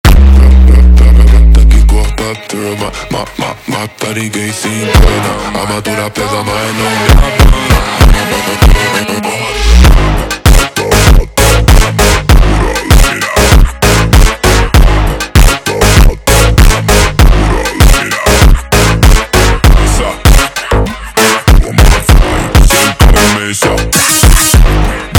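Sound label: slowed, phonk music; funk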